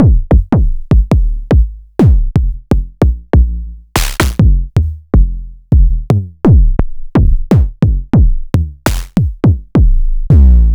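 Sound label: ms20 processed kicks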